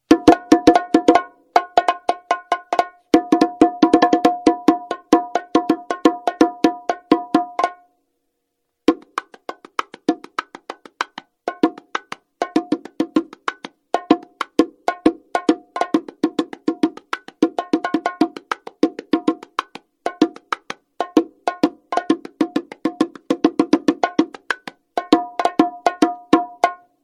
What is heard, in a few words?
TOCA CUSTOM NORMAL PATTERN, bongo; CUSTOM DELUXE